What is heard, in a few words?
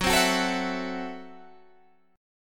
F sharp Minor 7th Flat 5th F sharp